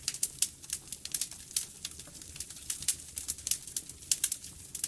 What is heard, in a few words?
comfy fire